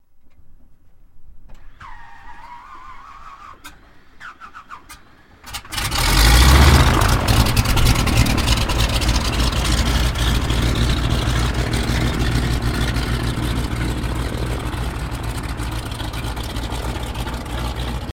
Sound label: Prop Plane DHC, de Havilland Canada, Beaver Single Engine Plane Start And Taxi